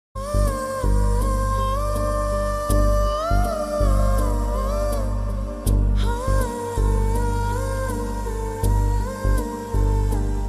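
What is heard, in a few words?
background music ringtone; bommarillu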